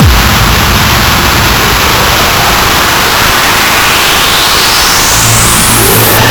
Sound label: jumpscare; cell